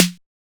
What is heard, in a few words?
snare, 808; 808 aristocrat